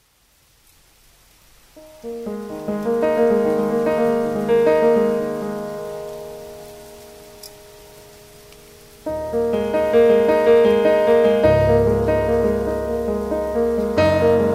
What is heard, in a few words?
Piano; Mobi-hybrid Musician Templates